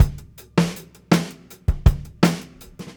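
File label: 81 bpm, beat loops; Zero-G; Monster Beats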